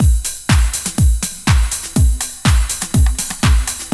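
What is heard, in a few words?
garage; AMG - Now CD-ROM (Roland); Megabass 2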